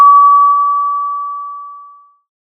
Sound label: pop, Sound of a doorbell